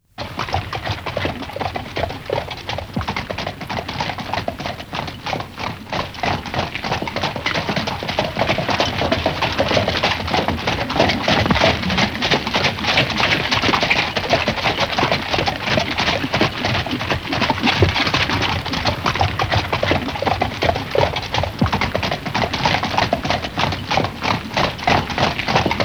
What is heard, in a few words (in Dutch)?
paardenwagen